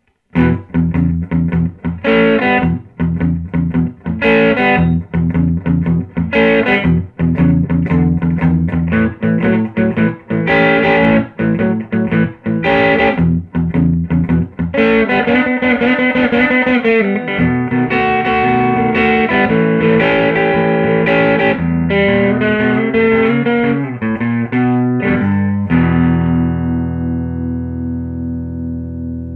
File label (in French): humb OD1 neck, guitare; HUMBUCKERS GNB